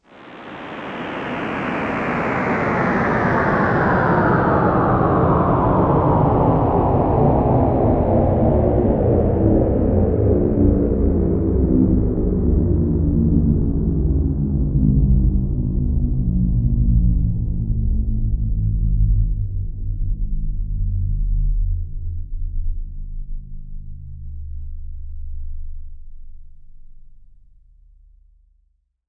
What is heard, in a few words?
POWER DOWN, Trance Fusion; ILIO